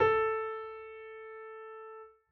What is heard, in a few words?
piano a; Golden Crayon Sounds